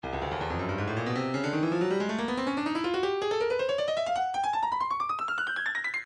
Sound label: breakbeat 160 bpm; Stylus; drumnbeat